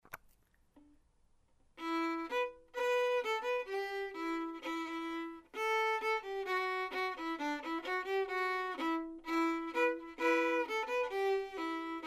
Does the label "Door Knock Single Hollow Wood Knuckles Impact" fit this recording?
No